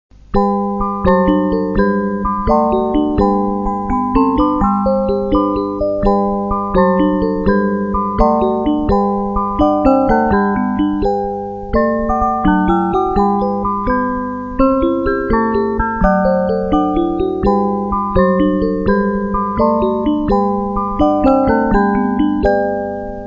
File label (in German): spieldosenmelodie